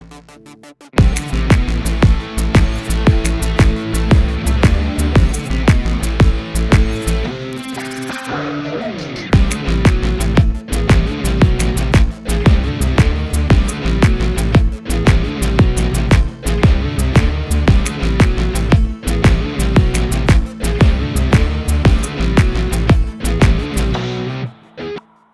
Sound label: College Election BGM Ringtone; Master BGM OST Movie